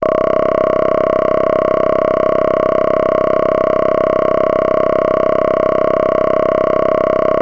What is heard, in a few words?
Access Virus B; Synthworx